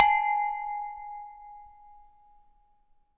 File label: Glass Clink, Beer Glasses